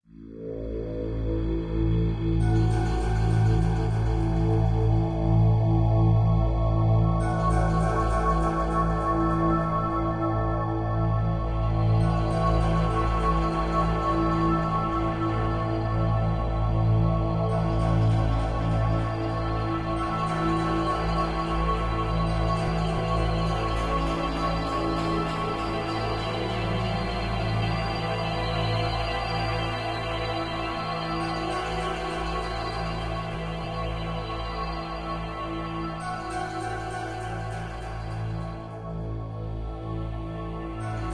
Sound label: Dungeoneering Floor Track